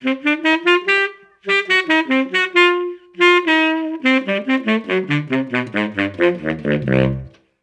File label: Tuba